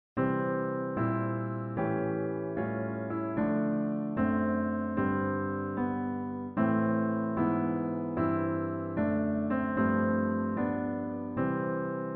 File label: Steinway D classical recording stereo